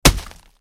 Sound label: impact blunt